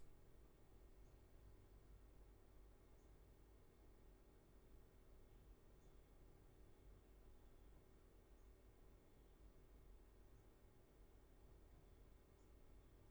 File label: roomtone